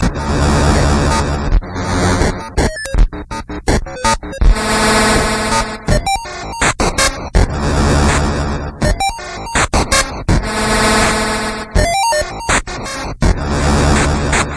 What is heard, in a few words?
swell